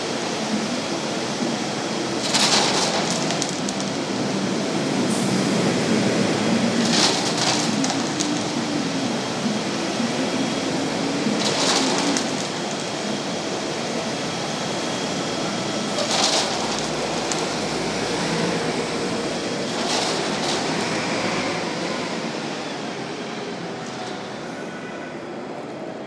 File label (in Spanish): camion hormigonera